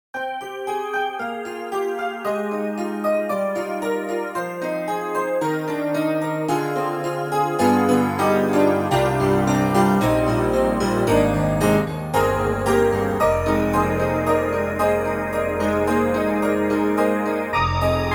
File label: Bell Choir Piano; Hybrid